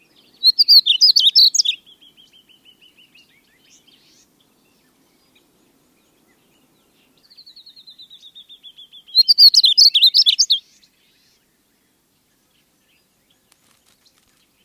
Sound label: Painted Bunting